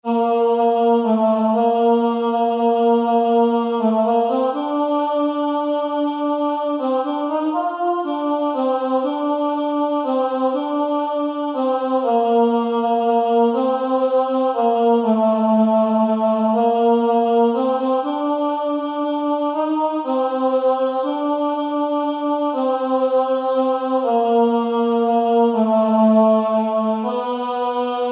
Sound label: Tenor; Cantate Domino